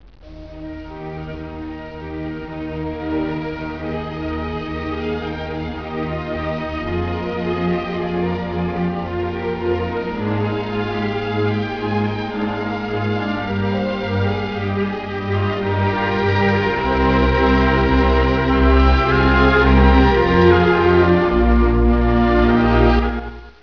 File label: mozart violin